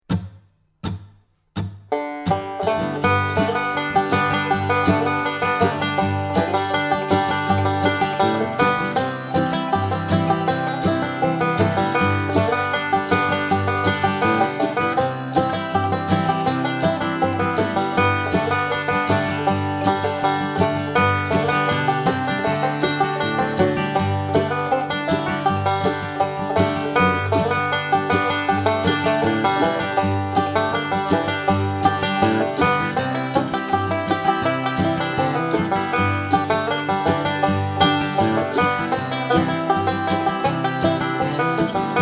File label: Banjo Play Along; banjo lesson